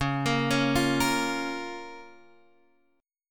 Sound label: Db 6th, Guitar, Open G